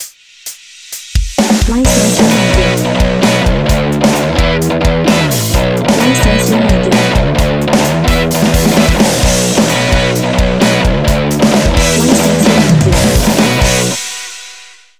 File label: Rough Bass